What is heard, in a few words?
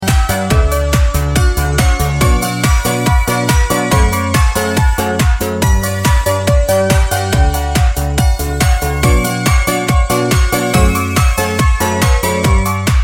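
bell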